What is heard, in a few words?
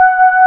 VO G4, ANA VOX, SYNTHESIZER; AKAI S6000 CD-ROM - Volume 1